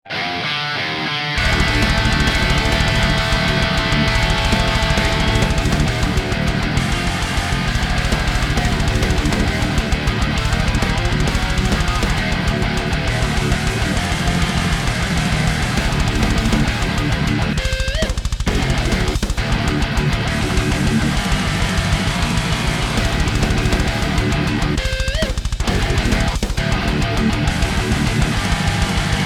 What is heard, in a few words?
riff with band